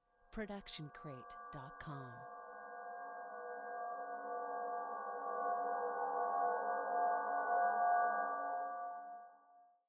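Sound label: scifi ambiance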